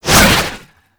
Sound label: Whip Whoosh; Warframe; Oraxia Whip; Weapons Melee